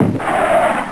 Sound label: ROCKET; WarBirds v1.10r0